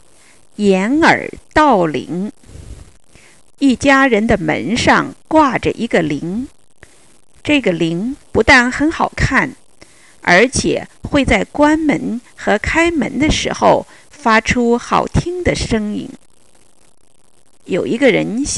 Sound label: bell